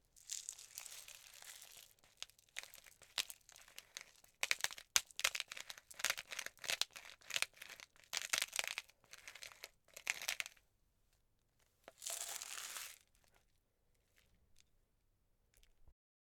Pepper Grinder

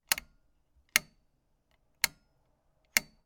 Light Switch

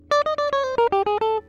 Guitar Jazz

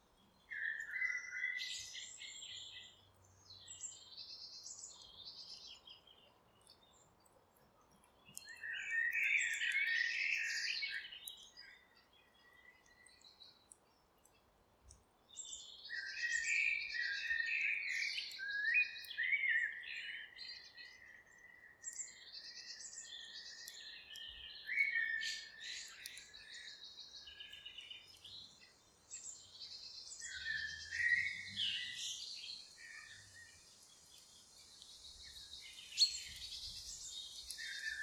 Park May 7:27pm

